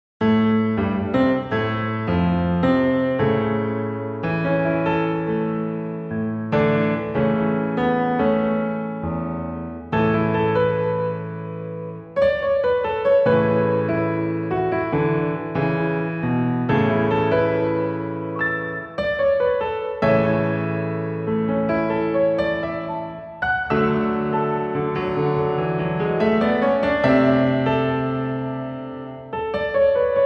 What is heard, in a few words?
piano